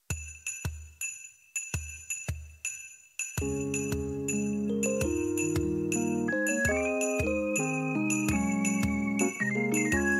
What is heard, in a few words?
Hymn